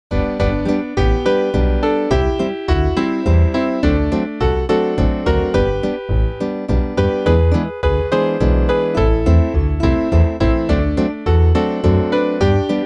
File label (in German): ballade 6/8